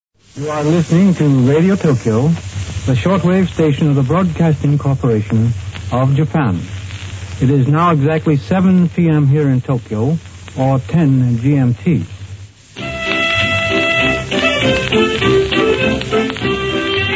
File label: interval signal; radio tokyo 1935